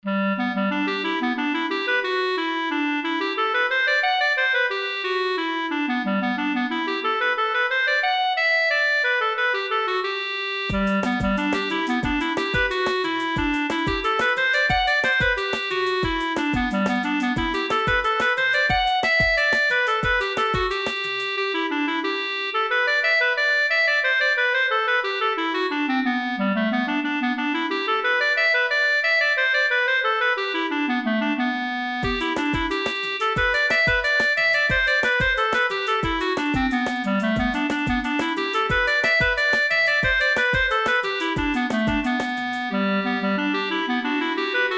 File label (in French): Scottish c quoi stair